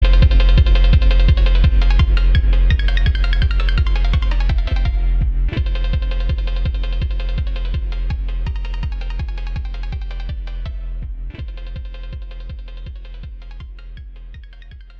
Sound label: phonk